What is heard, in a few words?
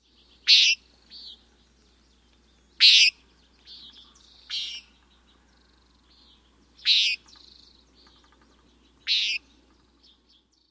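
Common Nighthawk peent variant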